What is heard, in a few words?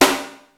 Ensoniq EPS Snare